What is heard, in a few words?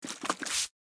Water bottle shaking and spraying